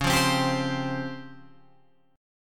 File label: C sharp Major 7th Flat 5th Guitar Open G; down; chord shape